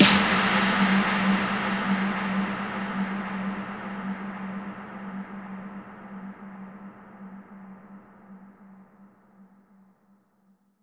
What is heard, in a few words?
Impacts, Effects